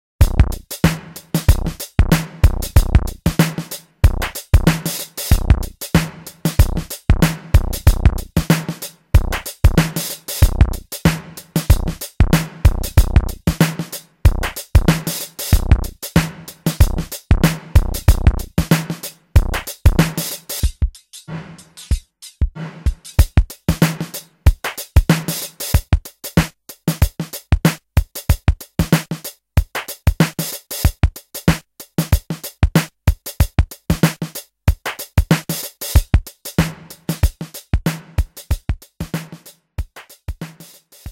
DMX jam

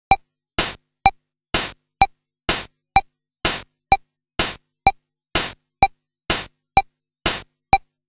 CASIO VL-1 MARCH

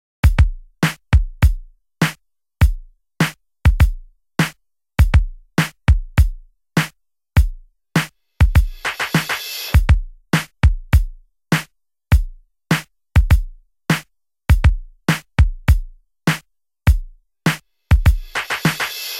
DMX PATTERN

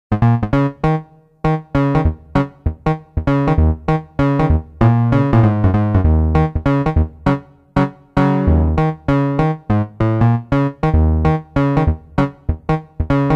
Funk

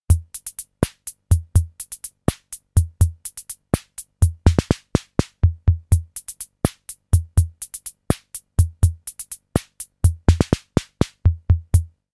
SR-88 PATTERN

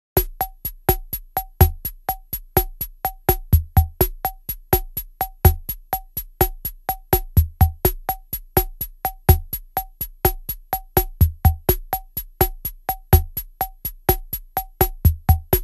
RHYTHM LATIN

